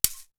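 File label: SHAKER FRONT; Univers Sons; Basicussions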